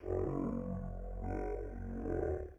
dino talk; angband

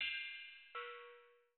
bell hand; angband

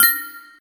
back, interface; redeclipse

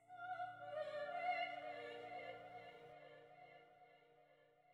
angel song; angband

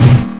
impulse; redeclipse

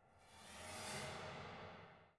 piano scrape; angband

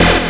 rush; ballz

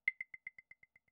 tone clave; angband

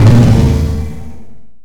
explode; redeclipse; weapons; mine

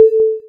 move; open-invaders

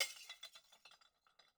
break glass; angband

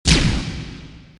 replace; vodovod

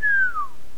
fall; magicor

